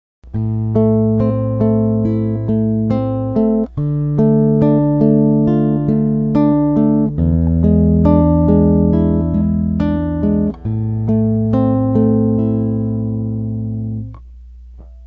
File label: arpeg am, guitar